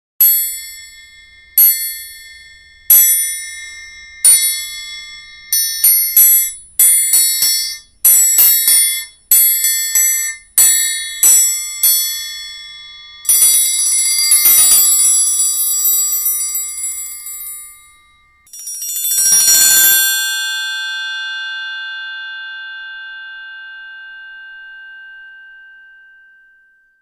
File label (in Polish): Trójkąt